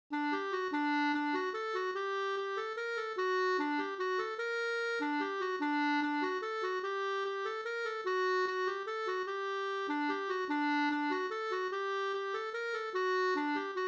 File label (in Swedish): vals Gmoll